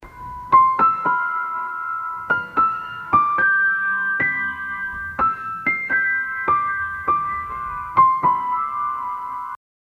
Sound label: sweet piano background mu